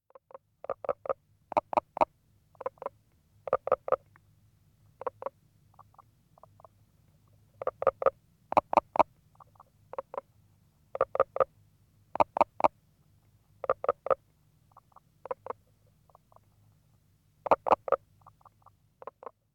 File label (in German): Knoblauchkroete Pelobates fuscus